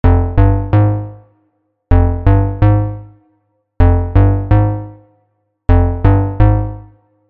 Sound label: Bass; Maxximal; Super Bitch